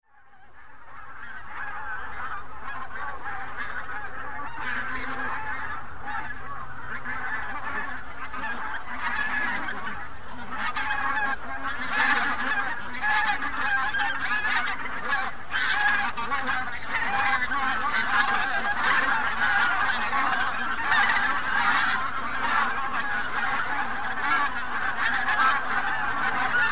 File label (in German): gaense